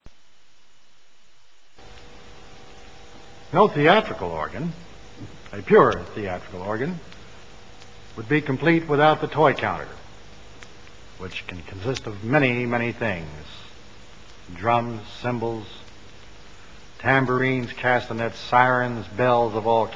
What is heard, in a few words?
Organ demo; USA